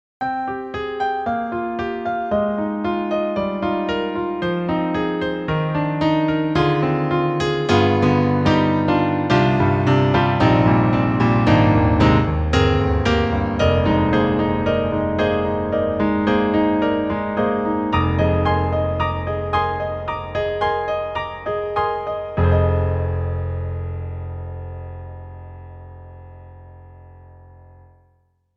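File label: Roland Juno-Gi Stage Piano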